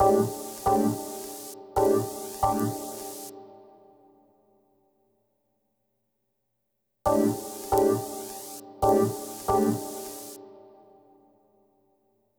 Vegas Piano Wet-G, 136; Zero-G Kocktail Kollection; Construction Kitz (Lounge); Vegas Time 136

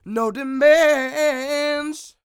SING W, Vocal Planet Blues & Soul, BLUESY; ILIO

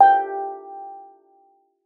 steeldrum G4; Xsample VOL-4

